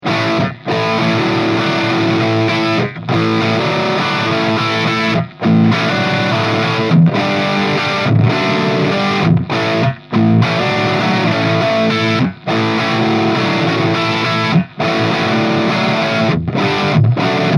Red Box Lead

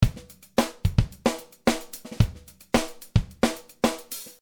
Loop

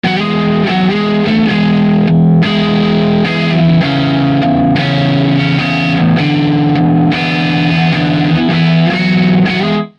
Guitar Plexi Lead

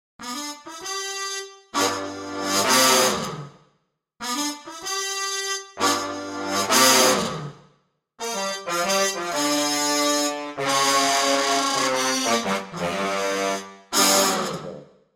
Combo Tr Tb

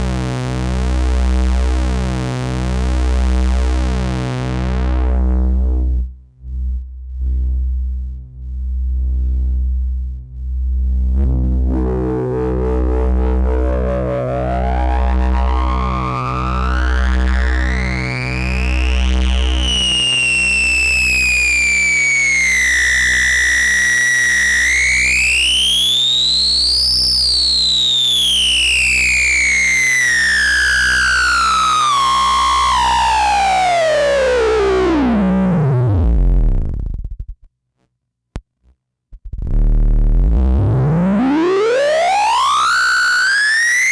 Dubreq Gen R 8 Filter

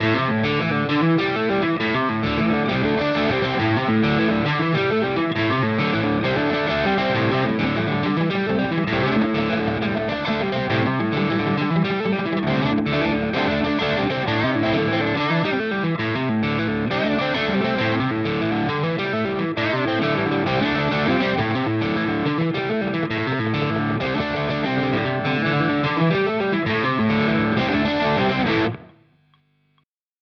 Space Charged V2 Single Coil High Gain; Crazy Tube Circuits

